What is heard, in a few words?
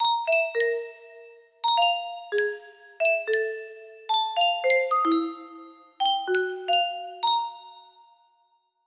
jingle, music box melody